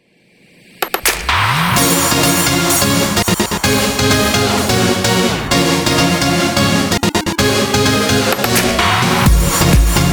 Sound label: Modern Dance Chart Opening